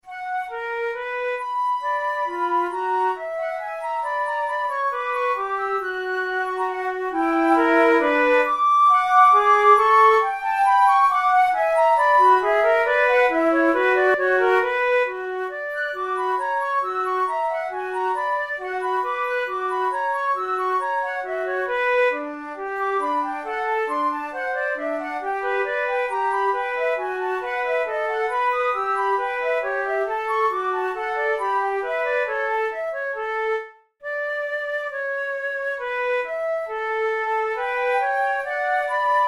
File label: duos for two flutes finale; romberg